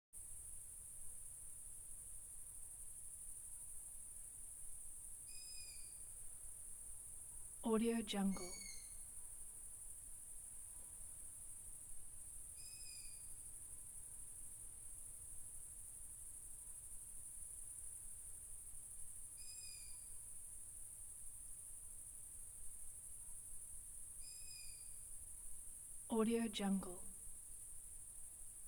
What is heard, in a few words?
crickets; dedgestudio